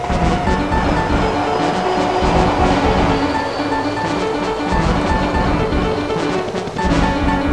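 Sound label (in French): ambi foire; MOBICLIC 13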